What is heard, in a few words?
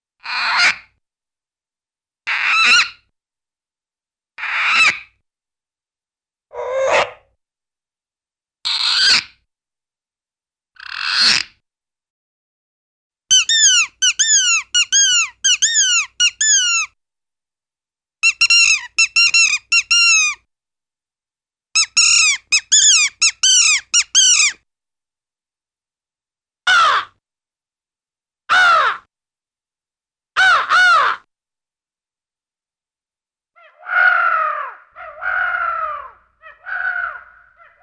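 Anhinga Bird with squeaky Squawks - Crow Owl Killdeer; Hollywood Edge; Cartoon Trax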